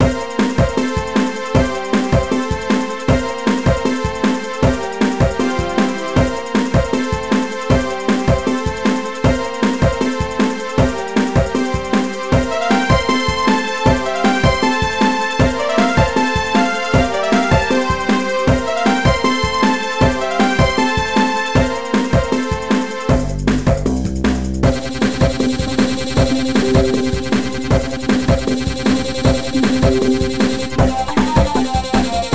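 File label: background music level; My Frogger Toy Trials; Nintendo DS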